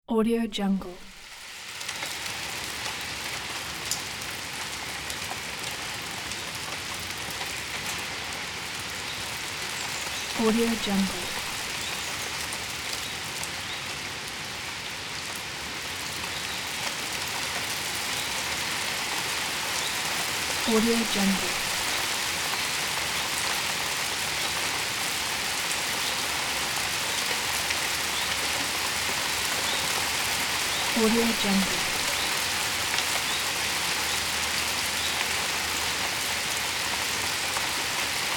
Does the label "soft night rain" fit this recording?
Yes